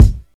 phat steel kick drum sound b key, kick sound tuned to the B note